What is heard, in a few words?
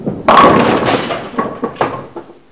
ROARK; Reflux